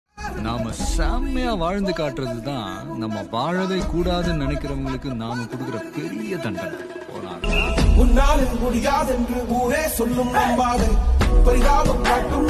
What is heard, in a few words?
song motivational